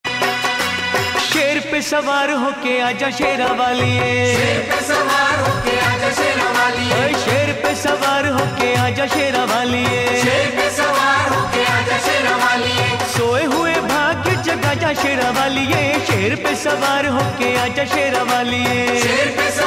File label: Durga Tone